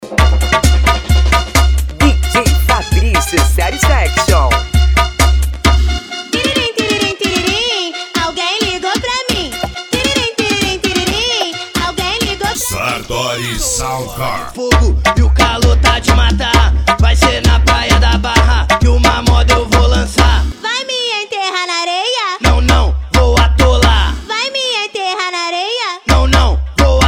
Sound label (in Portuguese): sound car; reboque poseidon sartori